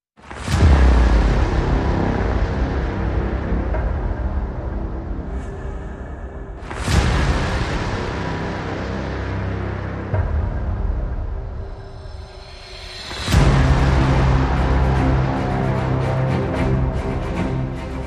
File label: No Choir